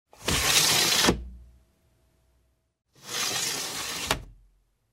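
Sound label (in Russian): алюминиевое окно открывают закрывают вверх-вниз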